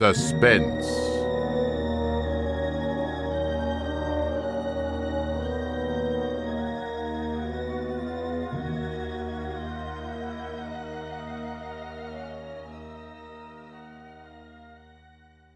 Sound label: suspense